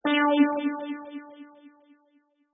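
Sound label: eighth note delay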